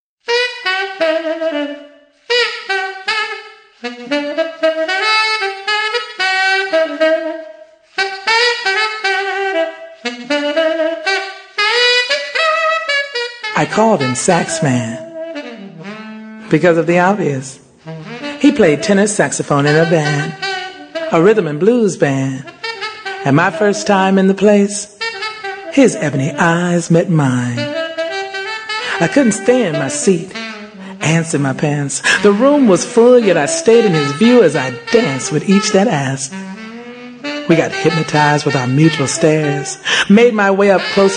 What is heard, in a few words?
saxman